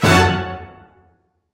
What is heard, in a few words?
orchestral hit